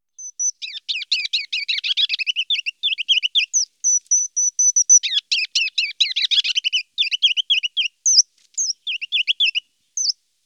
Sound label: kinglet ruby crowned, birdsong